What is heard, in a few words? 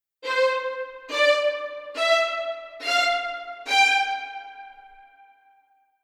Strings Art Marc